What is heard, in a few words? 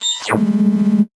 VocoBit Noise, 8-bit; musicradar; 8-bit bonanza